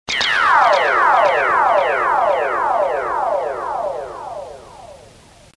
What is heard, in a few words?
Laser